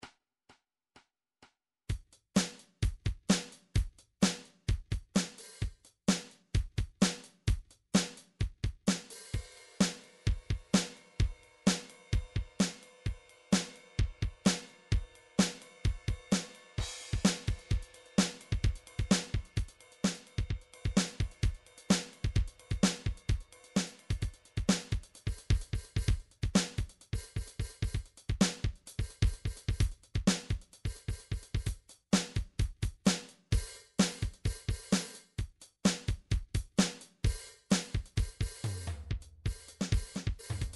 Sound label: dance pop